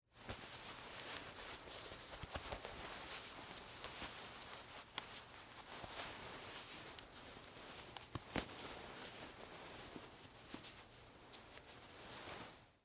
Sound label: Wool Coat Button, Foley, Clothes, Wool, Coat, Button, Buttons; Dressing; BlastWave